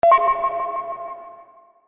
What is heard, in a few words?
game won